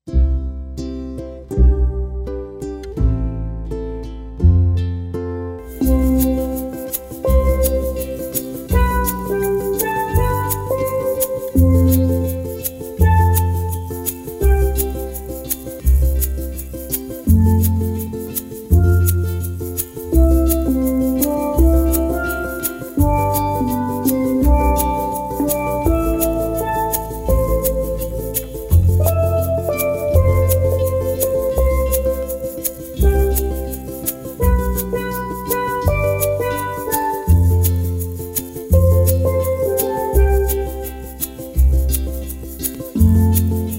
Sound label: steel drum